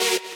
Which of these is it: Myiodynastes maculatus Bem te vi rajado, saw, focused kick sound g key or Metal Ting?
saw